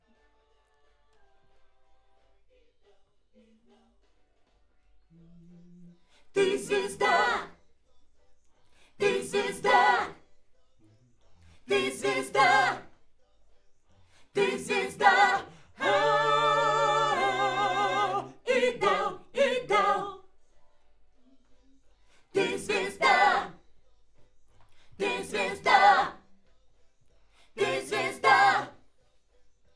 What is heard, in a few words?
Schoeps AKG, piano